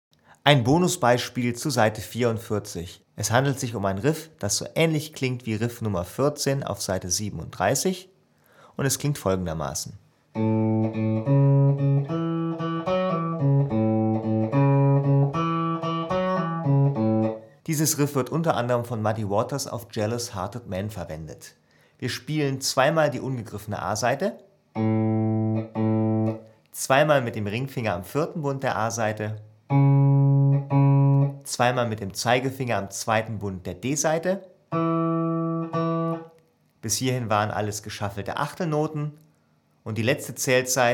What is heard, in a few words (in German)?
Blues Riff B3